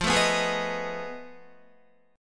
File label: F 7th Sharp 9th Flat 5th F down Guitar; F7#9b5 strummed